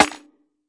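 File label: classic hip hop snare; Classic Hip Hop Mega Kit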